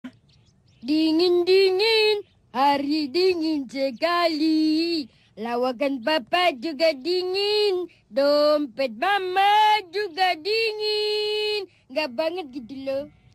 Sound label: dingin